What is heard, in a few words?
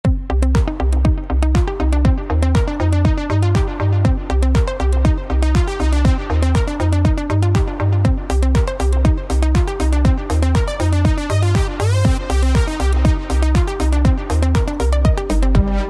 Surge Encore Remix, iOS 26 ringtones; Apple’s iOS 26